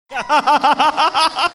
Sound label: laughing